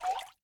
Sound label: Swim; SMBW